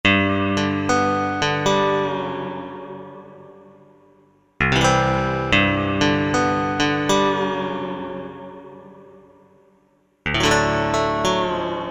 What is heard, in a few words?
Roarng, Korg MSC-6S Fretted Insts; Fretted Insts 1